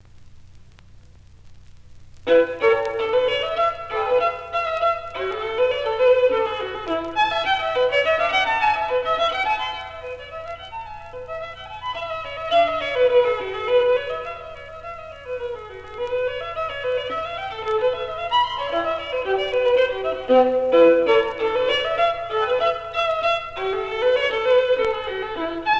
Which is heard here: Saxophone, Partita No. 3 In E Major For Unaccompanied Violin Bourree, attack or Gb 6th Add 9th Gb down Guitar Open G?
Partita No. 3 In E Major For Unaccompanied Violin Bourree